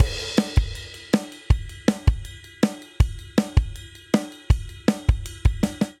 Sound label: Energetic Pop Chorus 160 bpm, Drum; 4 4; MIDI Clips; Ableton; Factory Packs; Drum Booth